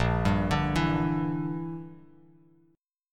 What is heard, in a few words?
A sharp 6th Flat 5th, Bass Standard; A#6b5 arpeggiated; JGuitar